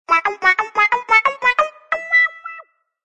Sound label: notification; Hisense; E602M